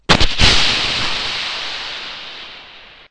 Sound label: napalm; cstrike